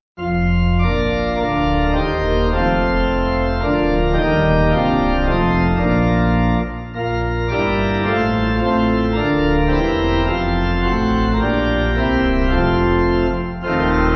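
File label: Pipe Organ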